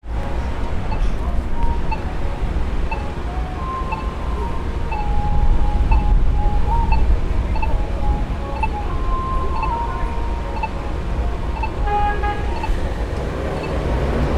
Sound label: busy intersection near park